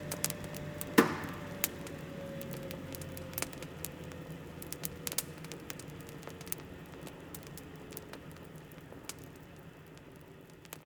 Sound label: fire oven; best service Blue Box